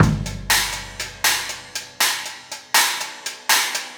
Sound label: Cymbal and Kick